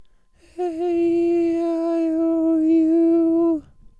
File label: aeiou High; A E I O U Sung in the high range